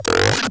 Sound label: chatty creature